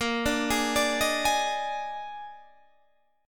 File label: Bb 11th, Guitar, Open D